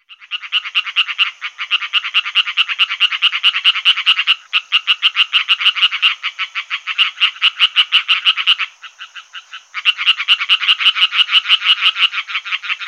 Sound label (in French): rainette verte